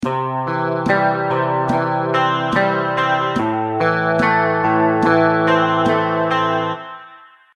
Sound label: guitar fingerpicking pattern